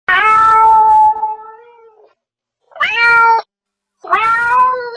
scary cat meowing sound effect sounds

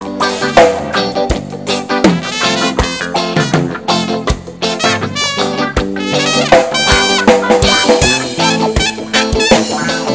instrumental

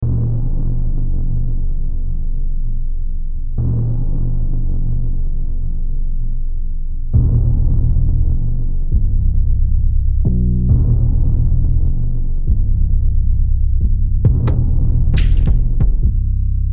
afrobeat

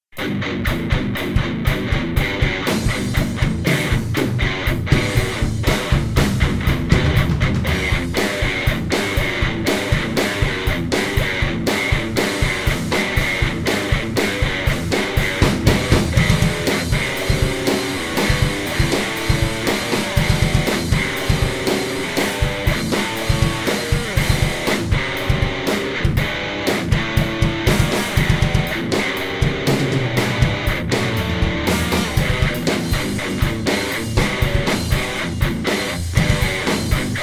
supermetal

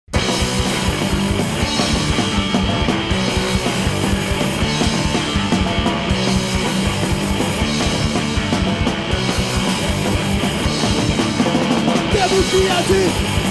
Punk Rock

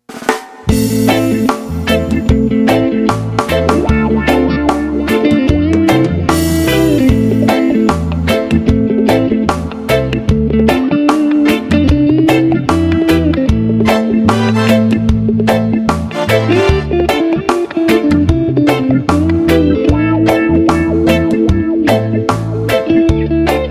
reggae pop

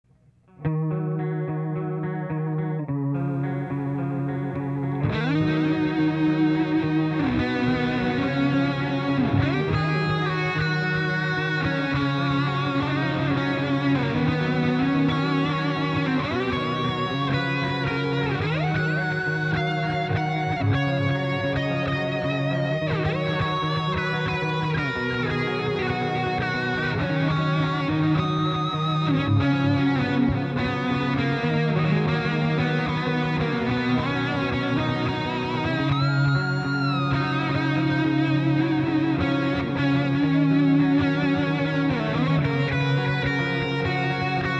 Solo

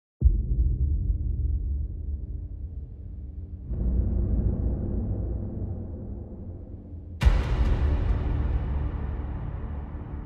trailer music dark intense hybrid horror music

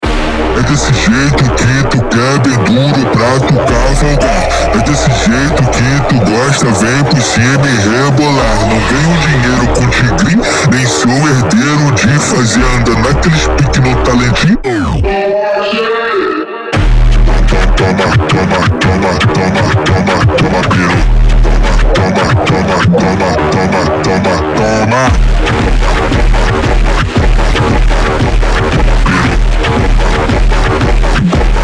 phonk slowed

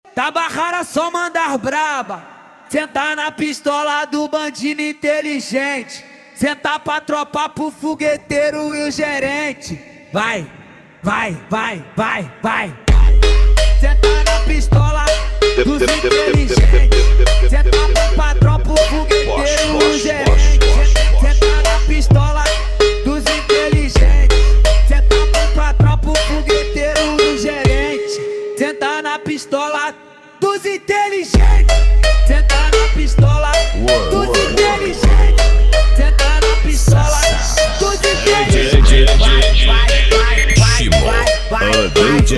funk bass; organizações tabajara

